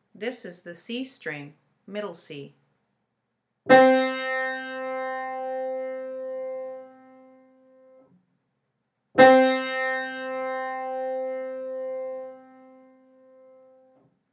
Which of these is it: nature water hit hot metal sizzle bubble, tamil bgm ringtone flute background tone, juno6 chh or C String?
C String